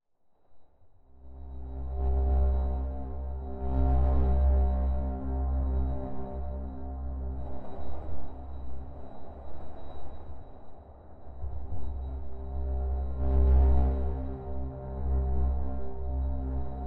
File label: Magnetic Field; 99Sounds; Magnetic Fields; Pink